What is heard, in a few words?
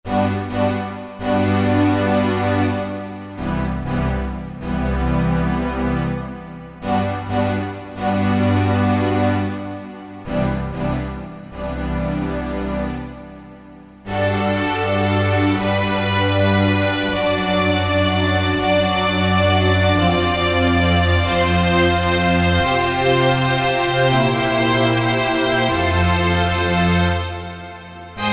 STRINGS, Korg DSS-1